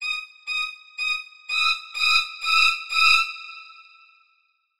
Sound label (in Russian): zvuki skripki